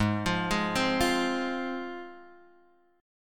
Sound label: Ab 6th Ab, Guitar, Open G